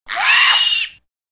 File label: Dragon Squeal